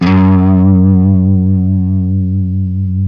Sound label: LES PAUL LD F#2; Spectrasonics; Hans Zimmer Guitars VOL-1